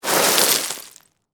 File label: Rocky Hillside, Footsteps, Slide, Down